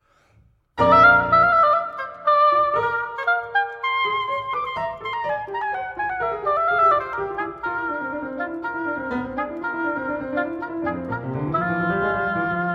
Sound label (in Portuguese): Sonata Para Oboé e Piano III. Allegro Vivace